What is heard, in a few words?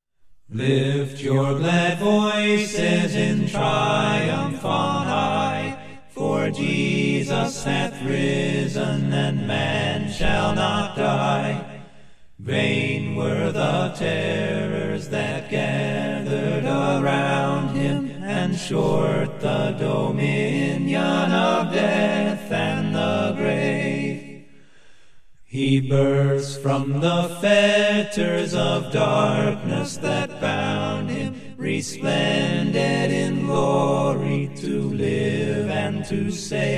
a capella hymn; lift your glad voices